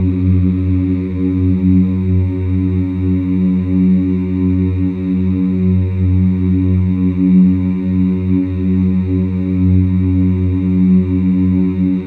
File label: castle tension layer; sky jac; windows gamerip 2016